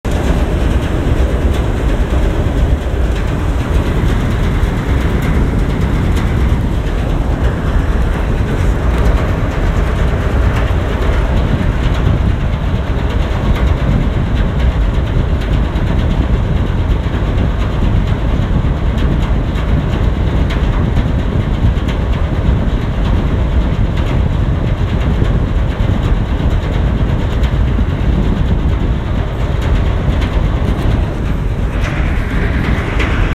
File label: ferry clang